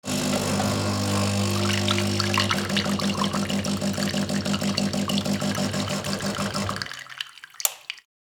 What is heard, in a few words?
Coffee machine brewing coffee; appliances